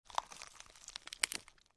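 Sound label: sounds of a snapped neck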